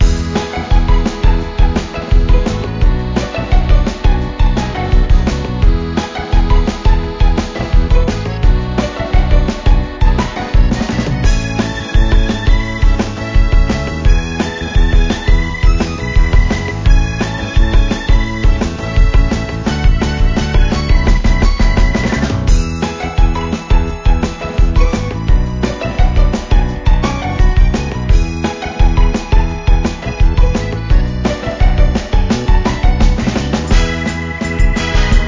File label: bgm quest loop; onegai